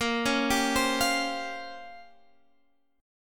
Bb Minor 6th Add 9th Bb down Guitar Half Step Down